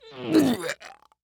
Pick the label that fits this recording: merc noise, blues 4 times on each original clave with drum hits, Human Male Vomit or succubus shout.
Human Male Vomit